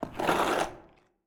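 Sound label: Zombie Footsteps; Blind Survivor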